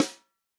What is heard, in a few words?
REAL DRUMS, POP KIT; Best Service ProSamples vol.43 - Real Drum Kits